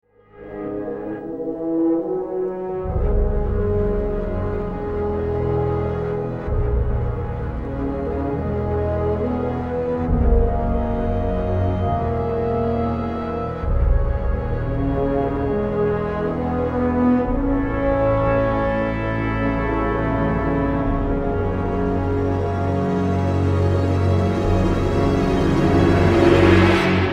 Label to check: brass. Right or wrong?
Right